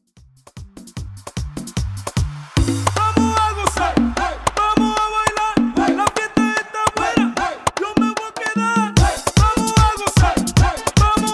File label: Intro Outro 150 BPM